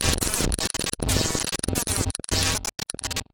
Bee Zap Constant; Lethal Company; Windows

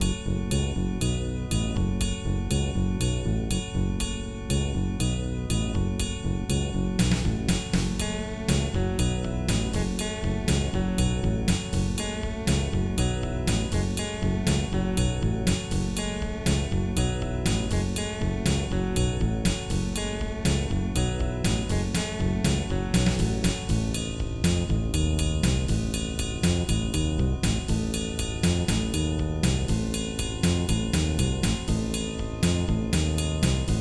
Searching, Arachno; Blake Stone Aliens of Gold; MS-DOS

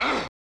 Draw Sword; dragon s lair snes gamerip

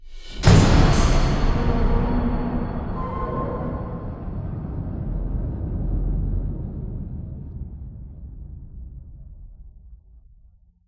Stinger Generic; conan exiles gamerip 2017

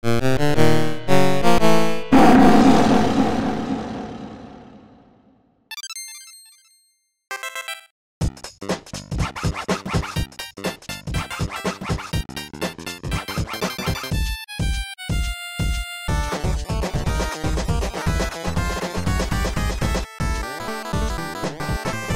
Jingles; Game & Wario; Wii U

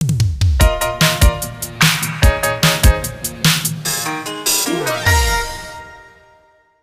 Jingle Op Fanfale; A-Train City Simulator; A-Ressha de Ikou 3D; 3DS

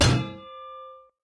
Shovel Hit Default; Lethal Company

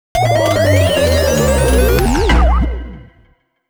Result Eliminated Game Over

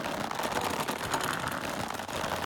Moving Obstacle Rope; boomerang fu

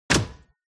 hand grab

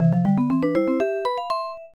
Chime; little mermaid the ariel s undersea adventure nintendo ds

